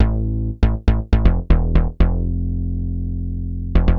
Digi Bass (120BPM), retro house

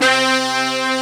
BRASS C2, BRASS SECTION; AKAI S6000 CD-ROM - Volume 1; BrassWind

